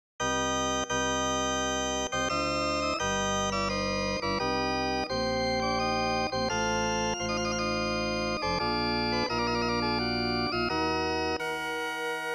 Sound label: Little prelude Fugue 35edo; The Bijingle